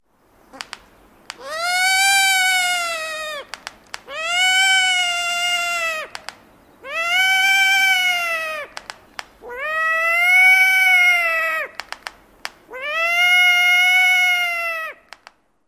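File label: bill snaps and squeals near nest, long-eared owl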